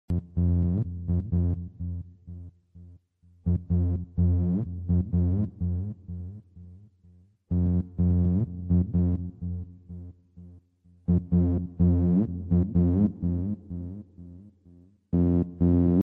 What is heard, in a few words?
bass el ringtone